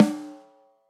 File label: SNARE stick off center